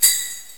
Jingle Bell, Drums; GMPlay; XG

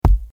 filler thud

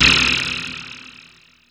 enemy kill; wob2 demo

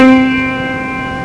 Piano; Old MacDonald

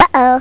oh-oooh; Baby Labyrinth

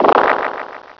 Magic; Skittles 1.0.1

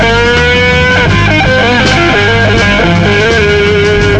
Riff; PlayRiffs 1.0.3

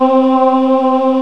Choir Aahs (C3); X-Wing Demo; X-Wing Patches

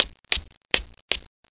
Stairs Walk Down Stairs

